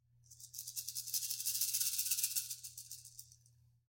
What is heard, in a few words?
rattle snake; soundscrate